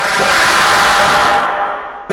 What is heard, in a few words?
cursed scream; stalker